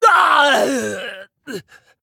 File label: Female Tauren Death